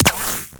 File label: Shield Impact